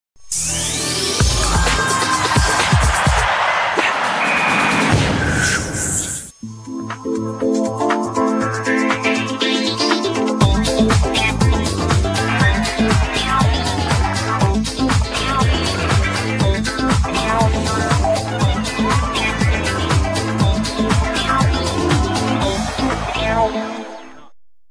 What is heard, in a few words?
jingle sport; france4